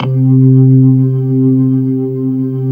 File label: C3, Keyboards Old School; USB Soundscan vol.09; AKAI